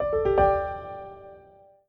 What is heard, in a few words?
Piano Notification